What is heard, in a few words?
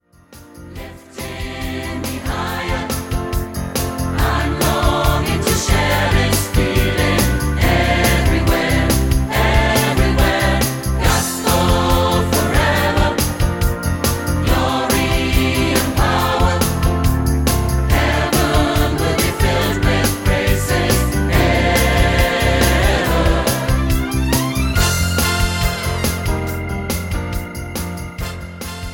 Gospel Forever